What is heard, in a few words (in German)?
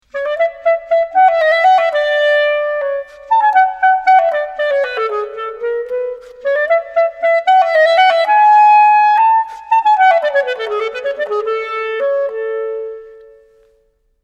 klarinette